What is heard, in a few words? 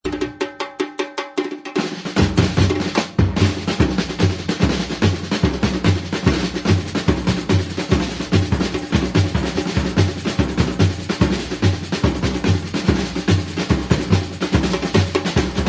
Samba Wings, קטע סמבה / מהירות גבוהה ומעבר כנפיים; Batucada